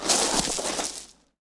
Bodyfall Gravel; SFX Kit; Bodyfalls

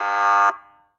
horn police; Blind Drive

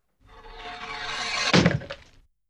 Guillotine, Scary Sounds; 1001 Sound Effects; Halloween Horror Sounds Effects

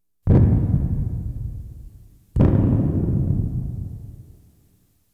Science Fiction effects, Lasers, Light beams

